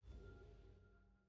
nav button lobby hover

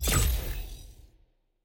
lobby button main click; strawberry

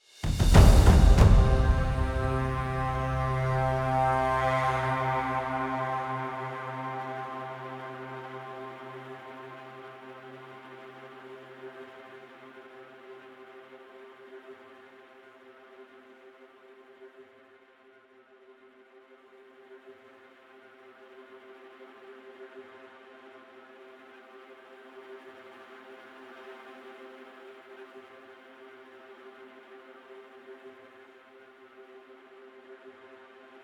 music draft; posassign; champ select

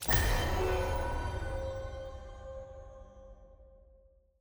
ui button click; kindred; lol

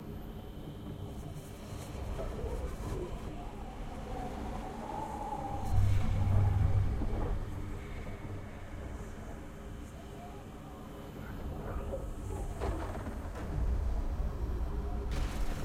amb mountains; freljord

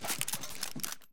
firing line

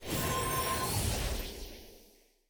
sfx loot tray receive material rare

